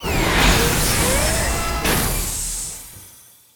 Menu Scifi Interface Open; Menu FX

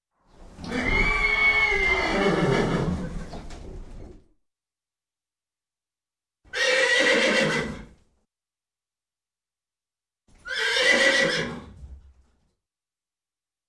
Horse Squeal; Sound Ideas; Series 2000

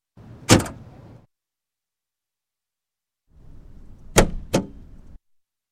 Car Hatchback; Sound Ideas; Series 2000

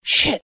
Monster Bump Yell; blindside

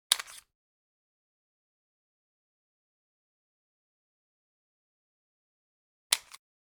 9mm Magazine Remove; Hollywood Edge; Heavily Armed

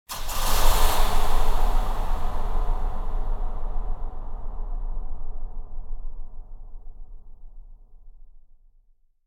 Impacts Distant Multi Hits Breaths Finale Hit; Hollywood Edge; sound designer's toolkit v2

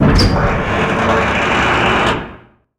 Hatch Large Metal Open; SFX Kit; Doors

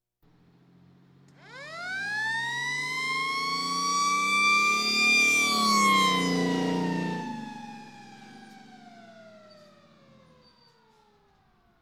Police, Fire engines

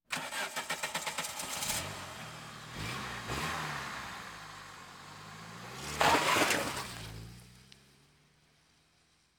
Automobiles various

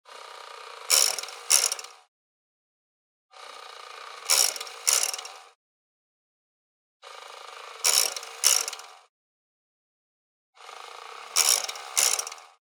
Generic Slide; boom library; The Interface

